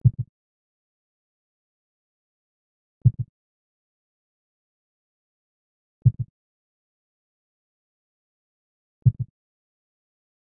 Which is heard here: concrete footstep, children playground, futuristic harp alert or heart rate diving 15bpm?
heart rate diving 15bpm